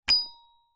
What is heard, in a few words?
leisure toy musical xylophone key plastic press single note